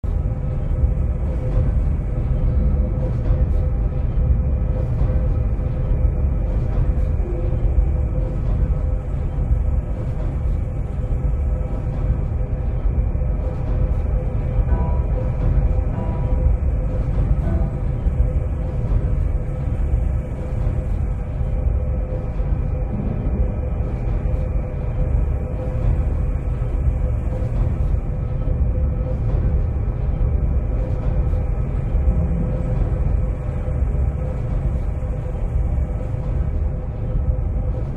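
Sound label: Ambience Bunker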